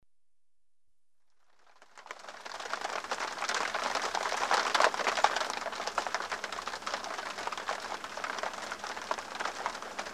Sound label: Rain on tent; Weather; The BBC Sound Effects Library